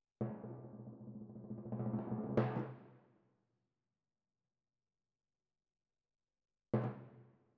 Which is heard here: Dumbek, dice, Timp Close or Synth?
Timp Close